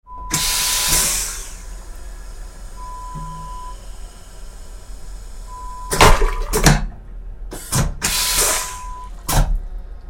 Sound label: Aeroplane toilet sound